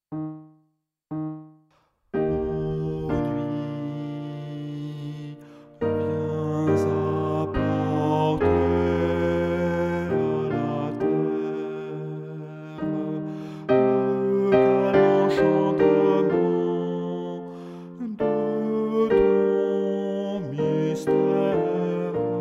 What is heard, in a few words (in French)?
alto